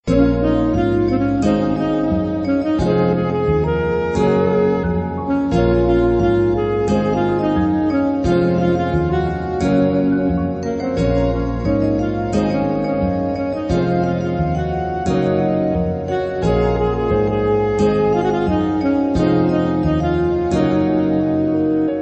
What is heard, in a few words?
jazz